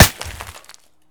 concrete; Escape from Tarkov

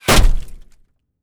hit, damage; DCS

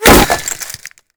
impact masonry; Modern Warfare

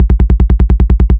loop dt bass; guns tanks

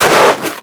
foot snow, footsteps

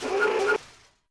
Tank Fort; Civ4 beyond sword

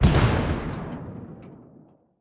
damage hits explosions, Warships

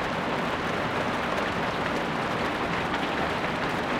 ambient battle; World Of Warships

